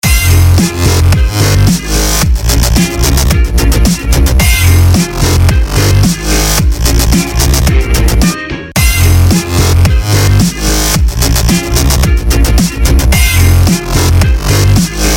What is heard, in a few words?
jazz groove